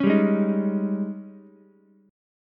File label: G sharp Major 7th Suspended 4th, Ukulele; G#M7sus4 strummed; JGuitar